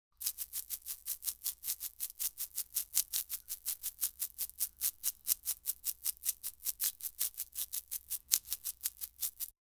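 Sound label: sound delicate almost silent, sprinkle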